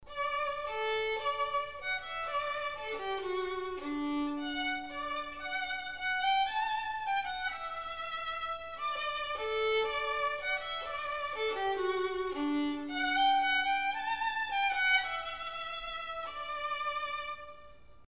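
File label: Kings March violin; wedding processionals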